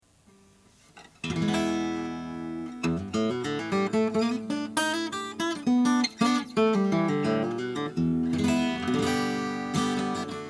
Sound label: vega style 55 natural brazilian; 1915